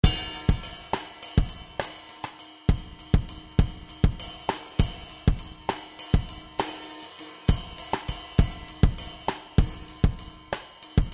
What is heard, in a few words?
drums 100bpm